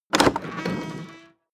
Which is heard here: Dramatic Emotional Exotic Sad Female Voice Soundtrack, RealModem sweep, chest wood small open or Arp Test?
chest wood small open